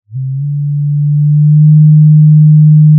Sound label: pop, Sound of a cruise ship horn